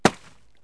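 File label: metal hit, impacts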